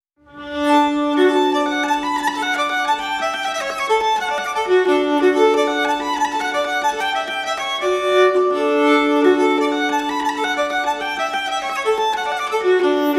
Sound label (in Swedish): vals i C-dur; Dannmarkarn